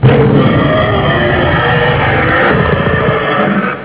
DINO FX

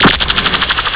RAYGUN

